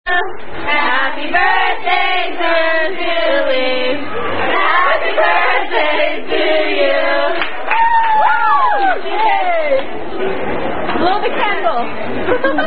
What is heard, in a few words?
Happy Birthday (Standard) Sung By Chili's Wait Staff